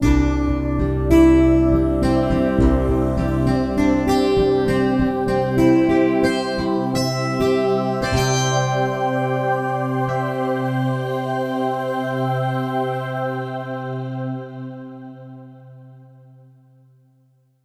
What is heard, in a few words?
Signature Sound; FXHome; Signature Tracks